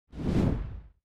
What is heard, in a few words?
transition coat